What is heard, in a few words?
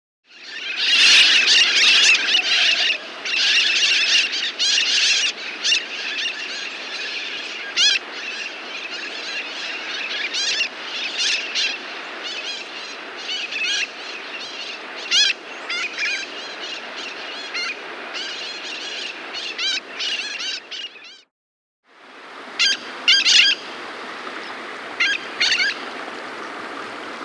Mitred Parakeet (Aratinga Mitrata), Andean Birds; S. America